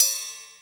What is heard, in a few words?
Ride, Ensoniq ASR-X